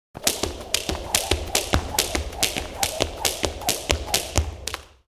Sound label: Jumping the rope